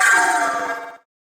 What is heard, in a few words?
Sentient Death Vocal; Warframe; Sacrifice; Sacrifice Shrine Intro; Cinematics